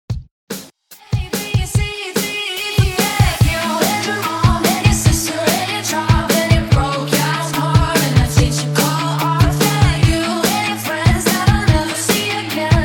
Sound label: HYPE REDRUM 145 BPM